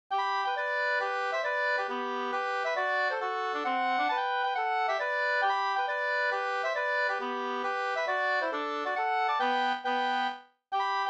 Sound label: mazurka; Kvintilaten av Erik Dannberg fi 2st